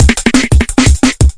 jungle, drum loops and breaks; 161 to 180 bpm

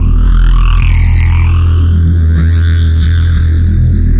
lower screamy giant synth; Basses

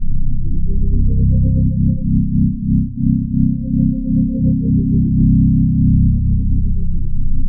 harmonic bass loop; Electro and Synthetic

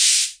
ham cym, Hammond Drum Machine; DRUM MACHINES

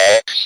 speak and spell X; ALPHABET

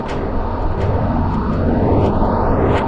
pretty noise rhythm; LOOPING AMBIENCE

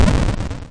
8bit kit explosion; LO FI and 8 BIT KITS; 8 Bit Videogame Kit

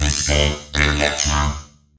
120 robot disco electro; VOCAL OR VOXY LOOPS

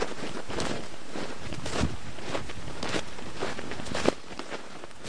crunching in snow loop; LOOPING AMBIENCE

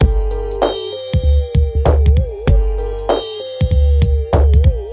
sci fi hip hop, drum loops and breaks, 97 bpm; 091 to 100 bpm

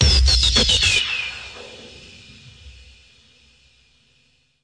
triple punch down; Punches Hits Discoblasts; single hits

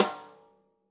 natural sd rim on; single hits

